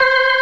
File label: organ, all stops; Keith Emerson Signature Series